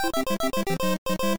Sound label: Jingle, Clear; Tuner Fight - Clear 1; Nintendo DSi